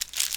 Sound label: clatter